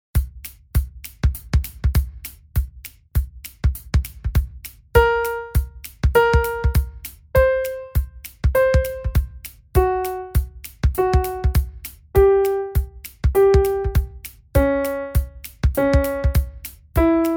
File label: random Pitch Practice